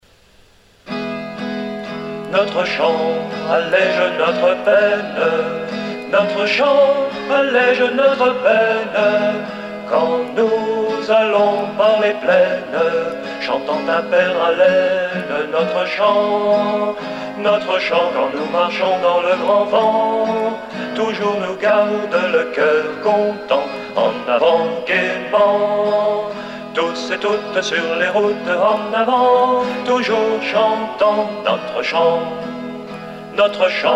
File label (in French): notre chant